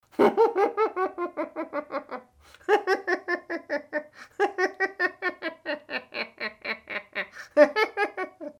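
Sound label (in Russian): звук смеха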